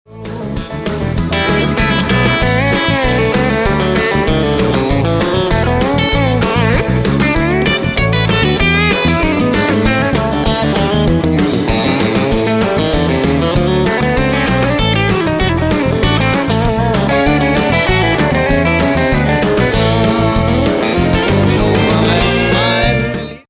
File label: Country Solo